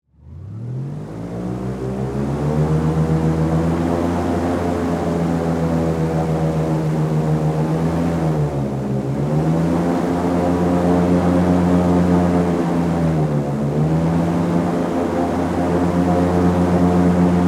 Airboat Run Medium, Transportation, Watercraft, Airboat, Run, Medium, Speed, On, Board, Perspective